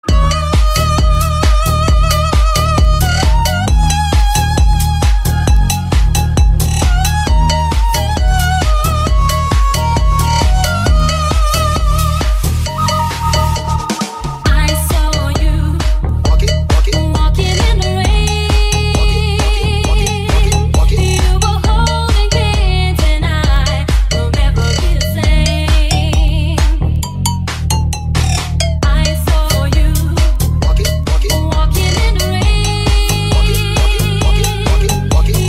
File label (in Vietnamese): nhac chuong remix